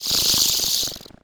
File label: imp chatter, monsters; DoomRTX; imp5